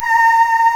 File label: FAH D#5; East West; Global Sounds